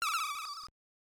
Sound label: shot; Gradius; MSX SCC